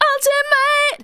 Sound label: vocals sung; Techno Trance Essentials